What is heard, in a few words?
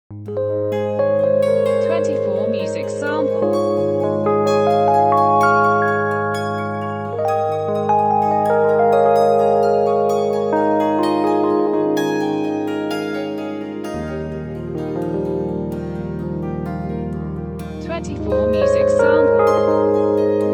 epiano samples